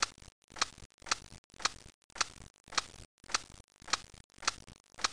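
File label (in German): Max Schrei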